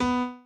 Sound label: piano chord